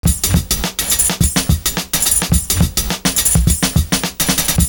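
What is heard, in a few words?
BELLY BEAT, 106 bpm; Univers Sons - Kitch 70s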